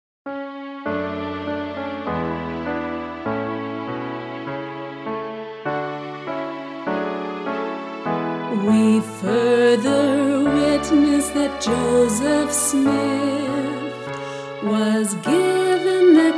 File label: Vocal